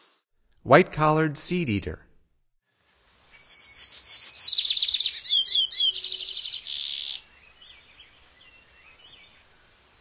White-collared Seedeater, Songs of Birds of North America; Stokes